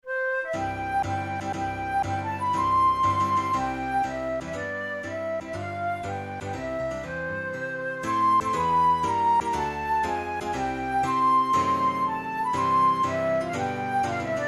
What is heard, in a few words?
national anthem, flute tunes; Mexico